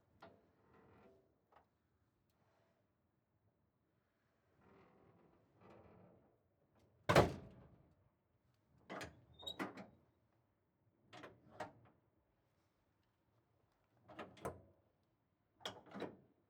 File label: Mustang Mach1 - hood bonnet open close handling, 1970 Ford Mustang v8; aXLsound